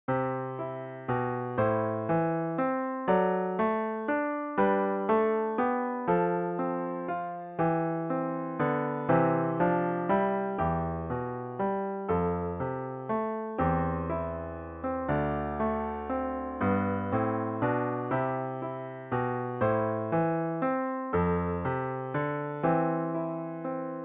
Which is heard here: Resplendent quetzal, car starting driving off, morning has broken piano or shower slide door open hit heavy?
morning has broken piano